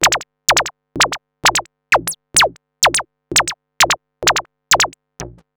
Odd Modular; radiosonics

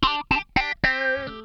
FAZE PLUK, Funk Guitar; 90 s Sample CDs; Zero G

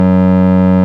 ORG, Farfisa Clarinet; Roland LCDP09 Keys of the 60s and 70s 1

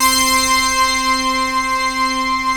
POLY REZO C5, Moog; USB Soundscan vol.49 - Analog Fantasies; AKAI

